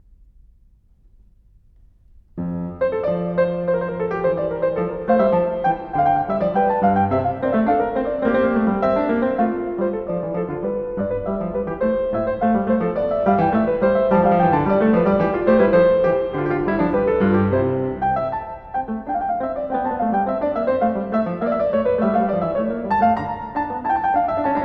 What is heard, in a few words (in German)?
Zweistimmige Inventionen F-Dur; Zweistimmige Inventionen VIII) F-Dur; BWV 794; BACH; Inventions